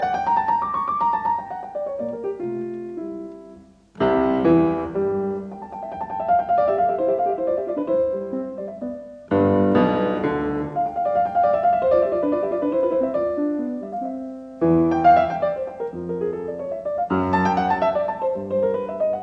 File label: Piano Sonata Allegro assai; No 2 in F major K 280 I; Lili Kraus